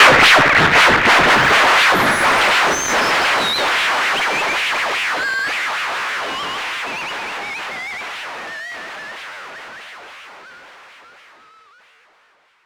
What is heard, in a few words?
swizzle; One Shot FX; Sample Magic; Transmission-X